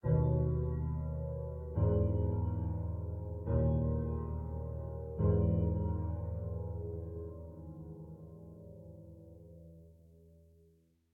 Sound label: Mid Day